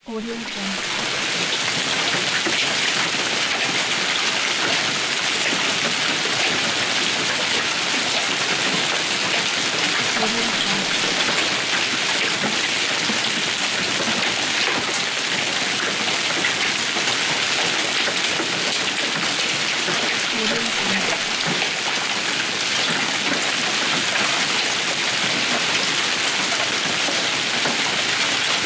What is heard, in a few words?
rain with hail